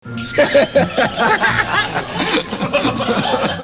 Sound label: laugh